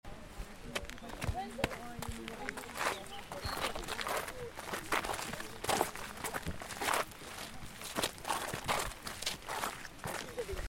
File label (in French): ambiance nature collégiens pas; college vallee du thore